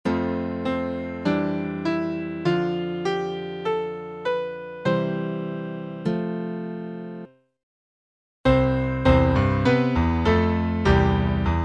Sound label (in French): lydien; Concert "Barzaz Breiz, la mémoire d'un peuple"